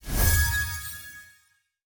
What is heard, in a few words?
ui mission complete